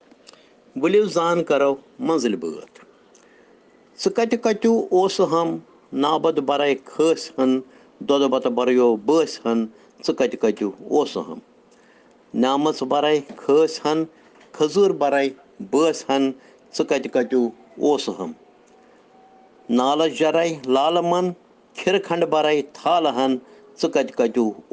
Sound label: Children Rhyms in Kashmiri